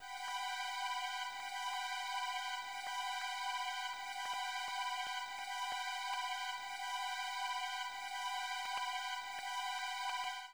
STR, Hip Hop and R&B; Best Service ProSamples vol.02; AKAI

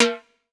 Hi Timbal, percussion; MARKYDRUMS

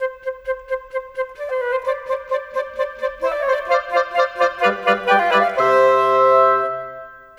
Cinematic Winds; Sonic Foundry (Sony Creative Software); Orchestral Series VOL-3; Cinematic 21

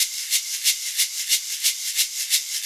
MARACAS; Sonic Foundry (Sony Creative Software); Joe Vitale Latin Percussion

